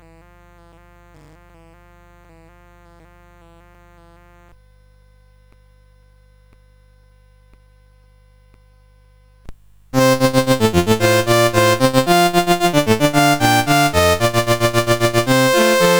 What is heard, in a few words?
Deck the Halls, CSG8580R5